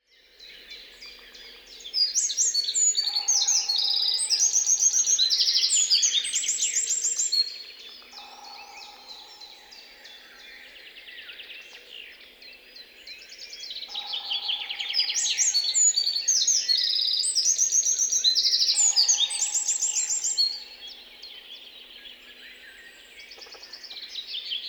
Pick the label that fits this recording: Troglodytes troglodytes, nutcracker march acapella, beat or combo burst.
Troglodytes troglodytes